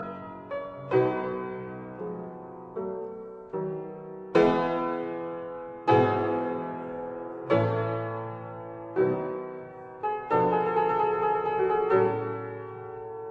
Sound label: Piano Sonata No 1 in C major Adagio; Op 24